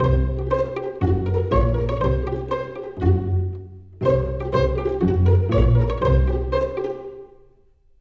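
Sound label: jungle world music, joyeux baston pizz; rayman origins 2011 gamerip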